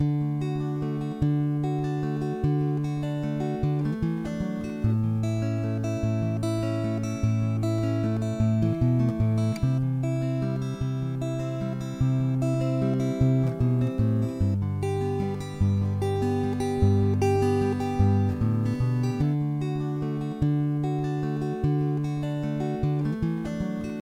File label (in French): guitare acoustique